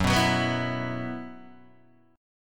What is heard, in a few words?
F sharp 7th Suspended 4th, Guitar, DADGAD